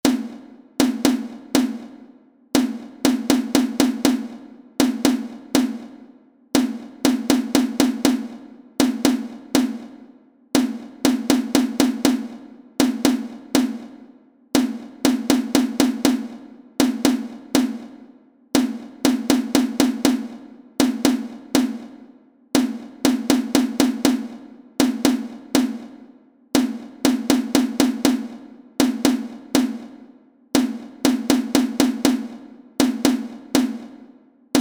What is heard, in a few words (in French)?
Surdo version modifiée lente